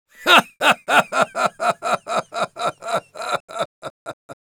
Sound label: Sniper laugh long, es; Risa Larga 1